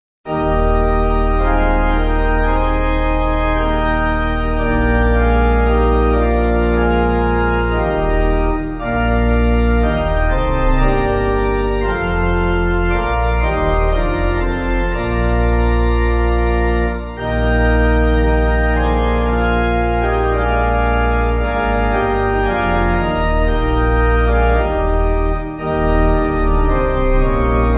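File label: We Would See Jesus, Pipe Organ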